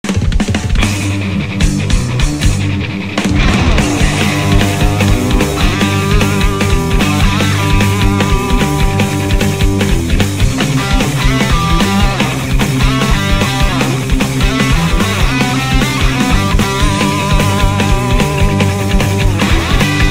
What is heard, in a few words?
Prelude + Transition; MKWRLD; Choco Mountain